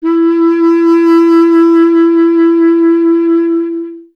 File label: B. Clarinet